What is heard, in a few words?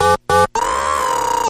droid; Streamlined